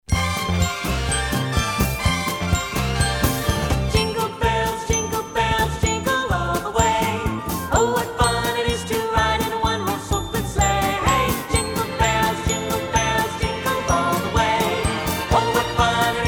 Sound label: Jingle Bells